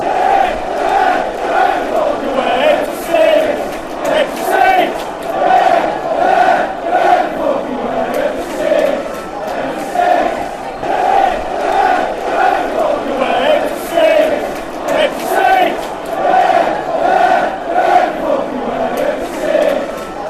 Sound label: empty stadium away match fanchants